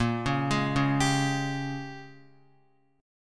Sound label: A sharp Minor 13th A sharp down Guitar, chord; arpeggiated; JGuitar